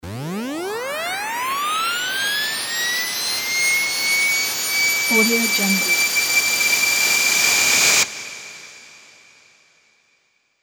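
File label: Chains, chain & prison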